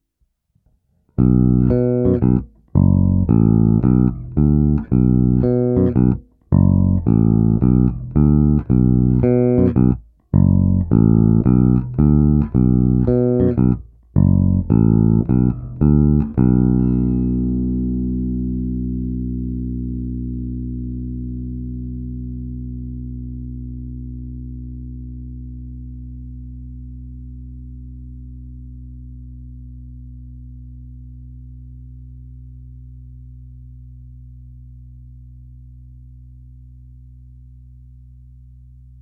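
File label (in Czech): Oba snímače, basa; Vlček Basses Fineso 5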